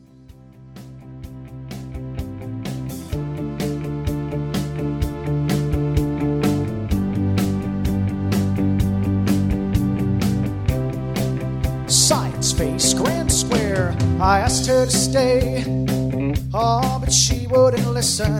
Instrumental With Melody

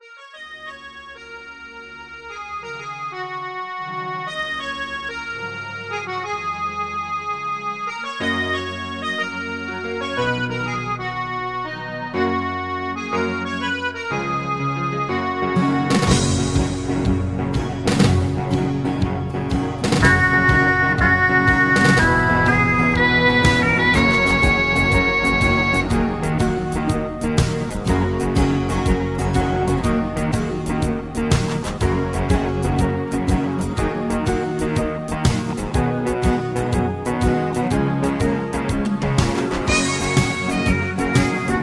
Low Key

